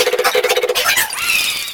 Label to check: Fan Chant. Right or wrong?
Right